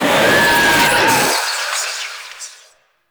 Equip Noctua Cast; Warframe; Dante

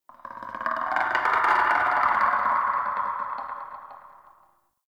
Chatter Scream; Ambience; Misc Outdoor; Warframe; Infested Eris

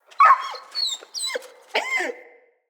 Wolf Cub Trapped Call Far; Animals; Wolf Cubs; Orengal Wolf Cub B

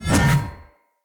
Two Handed Mace Whoosh Heavy A; Weapons; Enemy; Blunt; Sinecure Two Handed Mace

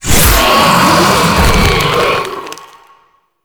Juggernaut Death Stinger; gameplay; Warframe; Jade Shadows

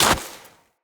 Walk Snow, Footsteps; Operator

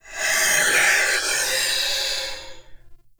Binaural Whispers; Warframe; Shadowgrapher

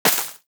Bullet Impact Debris Snow

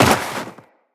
Walk Snow, Footsteps; Characters; Tenno

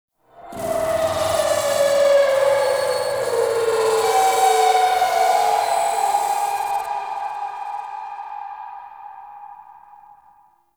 Modular Texture, Ambience

